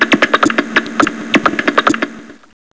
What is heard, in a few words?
laugh rats; info ciencia